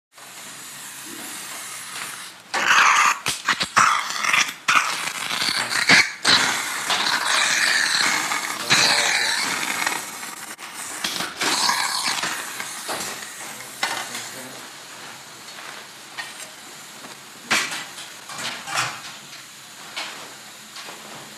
HOSPITAL EQUIPMENT HOSPITAL: Operating room, suction, patient coughing.